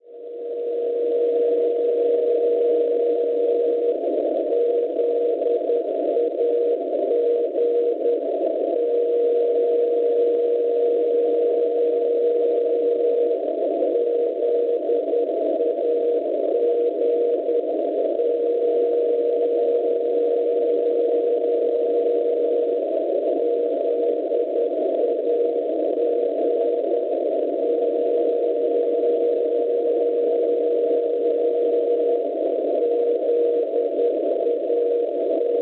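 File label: NDB non-directional beacon, 367 kHz; ZAG; Zagabria; Croazia; Schwarzsee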